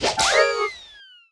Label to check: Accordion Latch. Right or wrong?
Right